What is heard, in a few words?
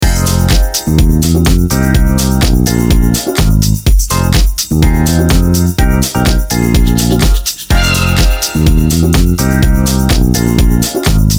Disco House Example Chords